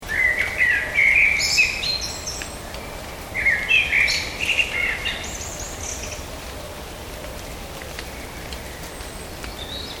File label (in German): Wald